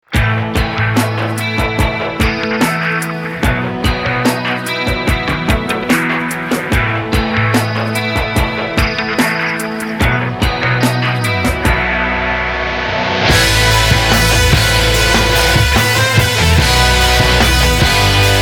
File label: Rock